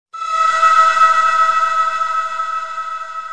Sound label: Air; Iphone4